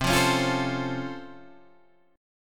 C sharp Augmented Major 7th C, chord

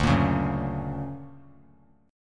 D Augmented Major 7th down; D+M7 strummed; JGuitar

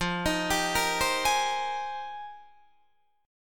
F Minor 11th, Guitar DADGAD

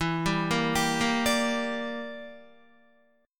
E Minor 7th Flat 5th E, Guitar; Em7b5 arpeggiated

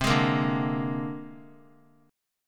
C 7th Suspended 2nd Sharp 5th C down; C7sus2#5 strummed; JGuitar

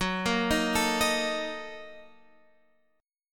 A sharp Major 7th Suspended 4th Sharp 5th F sharp

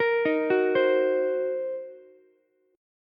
C Minor 7th Flat 5th C down Ukulele; Cm7b5 arpeggiated; JGuitar

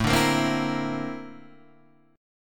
Bb Major Sharp 11th A, chord

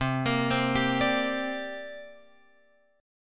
C 7th Suspended 2nd, Guitar, Open F; C7sus2 arpeggiated; x,7,10,7,10,9

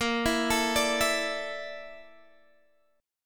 A sharp Major 7th Flat 5th, Guitar Open G

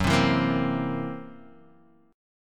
Fsharp 7th Flat 5th down Guitar Half Step Down

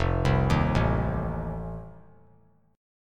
F 7th F down; F7 arpeggiated

